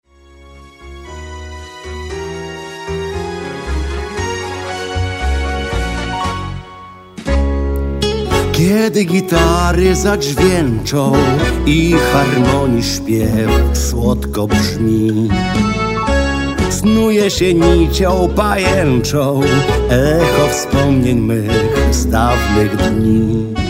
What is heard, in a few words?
tango milonga